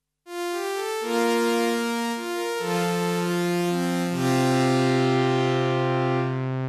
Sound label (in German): Cutoff Beispiel; virusc